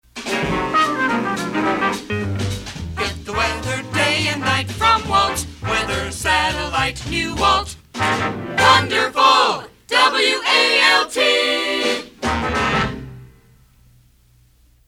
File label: weather jingle Dramatic Signatures; Pams Series-14; 1960